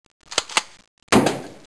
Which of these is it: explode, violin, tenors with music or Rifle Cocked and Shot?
Rifle Cocked and Shot